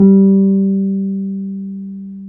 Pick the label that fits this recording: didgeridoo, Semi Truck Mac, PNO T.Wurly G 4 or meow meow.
PNO T.Wurly G 4